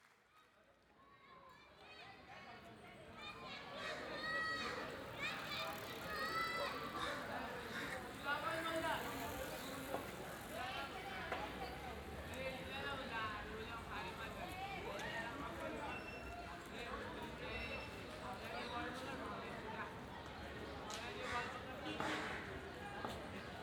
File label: Cricket Match; Craig Vear